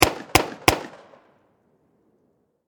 Warfare Pistol 45 Springfield Triple Shot Distant Perspective